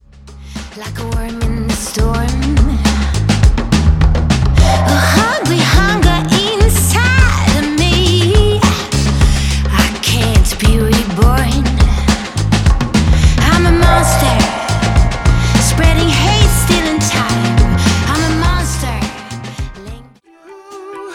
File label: Indie